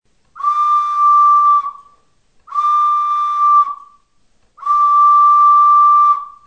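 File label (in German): Anblasen falsch, Okarina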